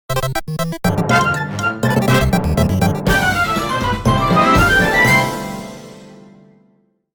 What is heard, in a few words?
Victory Theme; Duck Hunt